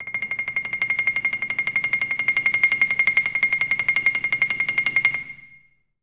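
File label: TREMOLO C3, Orchestra; Best Service; Peter Siedlaczek's Advanced Orchestra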